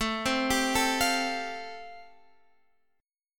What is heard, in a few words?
A-Minor 7th Sharp 5th, chord